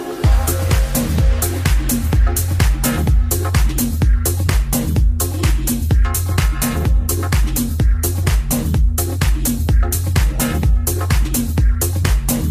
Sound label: boiler room